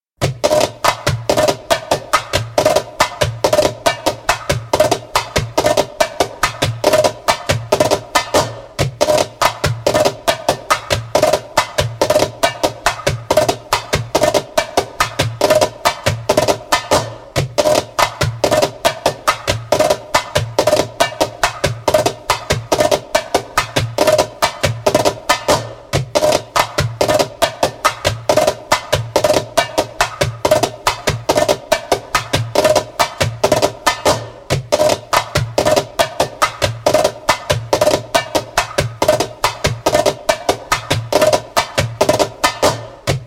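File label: Clear 'n Crisp (fast) spoken, djembe; Djembolale - Fills and Drills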